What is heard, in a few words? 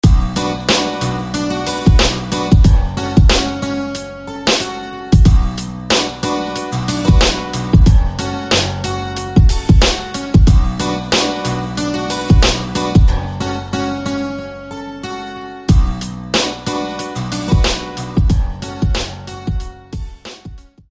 Guitar; Glowing Hand; SFB FX